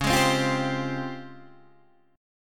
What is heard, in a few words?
Db Major Sharp 11th, Guitar, Open D